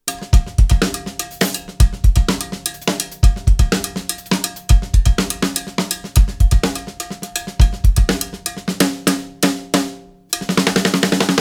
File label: drum skit